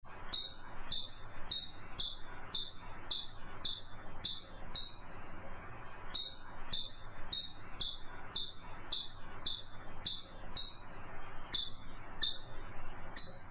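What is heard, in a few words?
chipmunk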